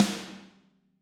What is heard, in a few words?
Snare Hard